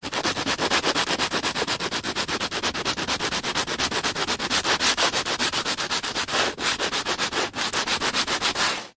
industrial sanding large piece of wood sand paper fast